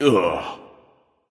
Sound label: ugh; smite; susano